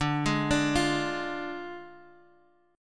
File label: D Diminished, Guitar, Open G